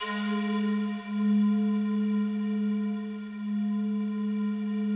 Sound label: FOG ATTACK, Yamaha VP-1; Music Store; 90's Sample CDs; FOGATTACK 2